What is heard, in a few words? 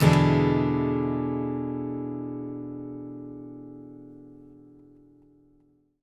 ACCGTR 7TH; Country